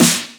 Snares; Vengeance - Essential Clubsounds Vol.4

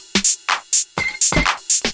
124bpm, House Perc Loops

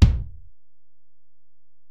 Ac BD; Alesis DM-PRO

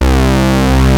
ACID SPLIT, ENSONIQ ESQ-1; Rubber Chicken Software Sample Bank

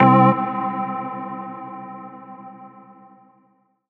90s Organ; Big Fish Audio; OSH Chords

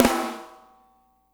FLAM, snares; Spectrasonics - Burning Grooves CD2

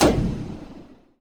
sfx sci fi; Masterbits - Switch FX

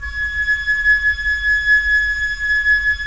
FLT FL, Flute; Roland - LA Composer vol. 4

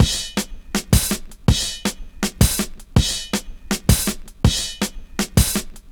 162, breaks; M8

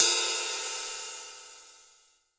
ZIL, 20 ZIL RIDE

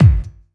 bass drum, 170 BPM, Drum & Bass; Ueberschall; Drum & Bass Resonance

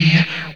VFX, VOX ALIEN; Best Service ProSamples vol.49 - FX-Area; AKAI

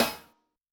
Ana We Rim, Yamaha DTXpress-II snare

